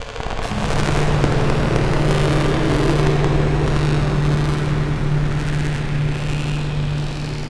Long Decay, FX

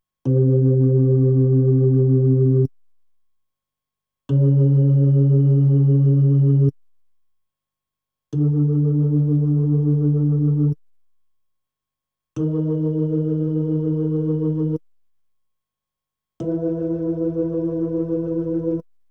B3 Organ; Masterbits CLIMAX 8

